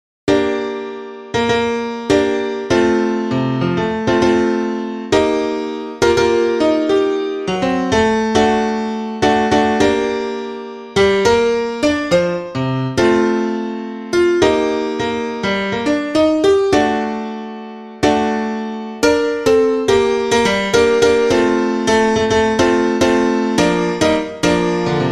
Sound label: piano